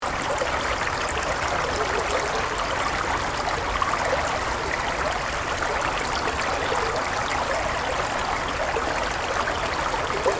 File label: the sound of a stream a spring stream the sound of water